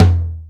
TOM, drums; Big Fish Audio; Synth City

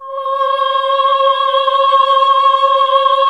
OHH C#3, classic vox

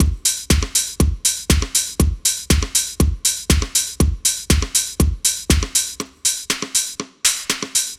4 floor beat, trance

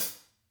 HAT; Sonic Reality - Vintage Timetraveler

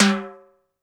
Timbale H, Standard Kit, Yamaha PSR-E323

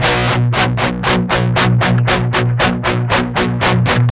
8TH C 3, Guitars; Spectrasonics; Hans Zimmer Guitars VOL-1; 128-JACKHAMR

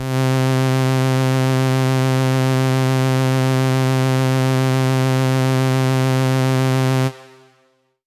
Pad C, Synth Prophet One Shots; Electronisounds

